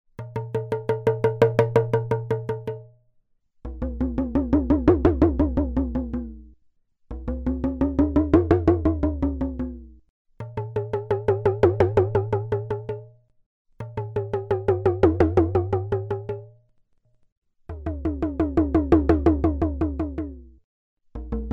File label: talkingdrum